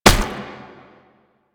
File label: Step; Warframe; Proto Infested Brachiolyst